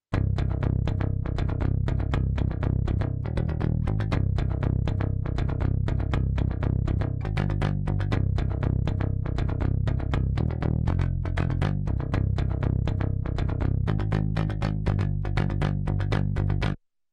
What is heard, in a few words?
Rock Bass Console MK3; Softube